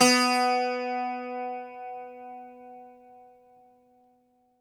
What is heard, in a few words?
Greek Bazouki, Plucked Strings